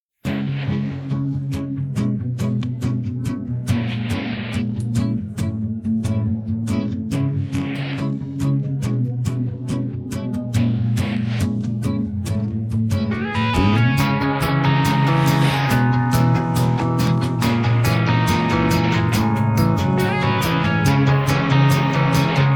no vocals; splitted by lalalai

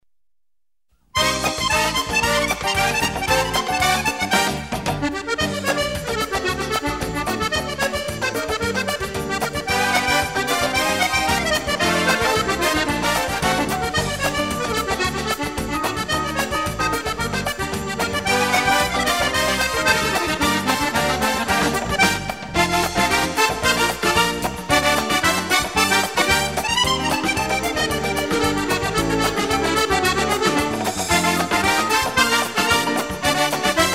SAMBA; LA SAMBA POPULAIRE

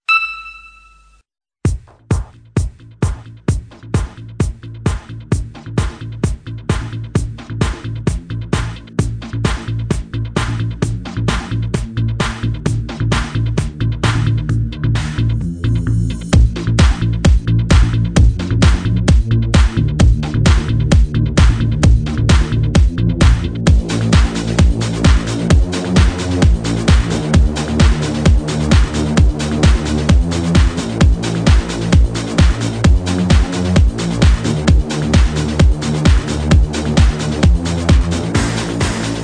techno

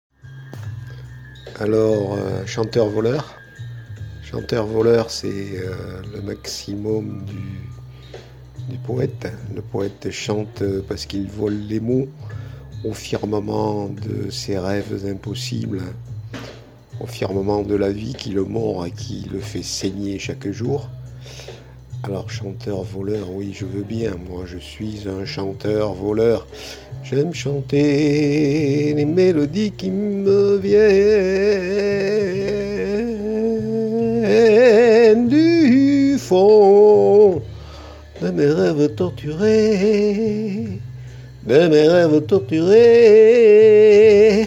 Hip out Impro

